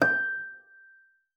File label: FLAG F#6, harp; Xsample VOL-8; HARP+FX